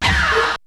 Hit; ZERO G - Datafile DISC 1

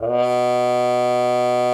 CUP MUTE; Xsample VOL-3

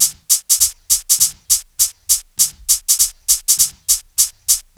Cabasa, Percussion Loops; Ambient Grooves

